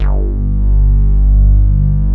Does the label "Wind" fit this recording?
No